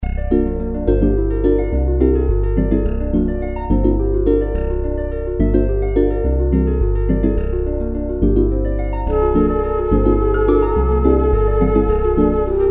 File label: Trumpet Song